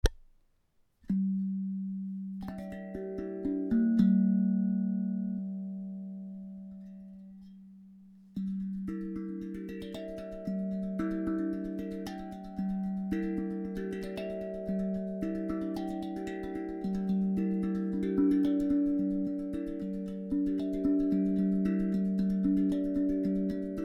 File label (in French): mains